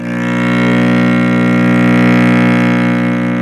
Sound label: cello; ILIO; Synclavier Strings